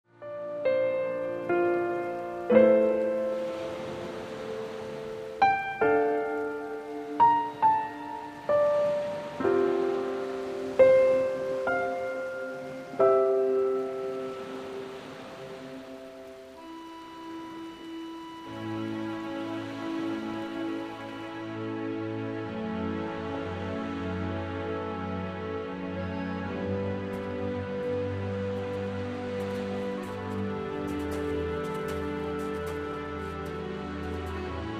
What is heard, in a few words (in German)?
nordsee entspannung, northsea relaxation soothing sounds piano; crushing waves